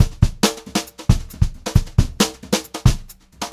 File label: 136 VARI, Brushed Drum Loops; USB Soundscan vol.39; AKAI; HOTROD